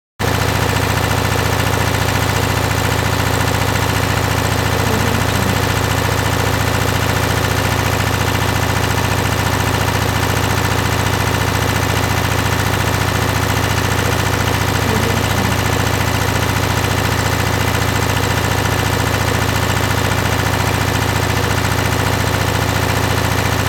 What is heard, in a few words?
large gas powered generator; hollywoodedge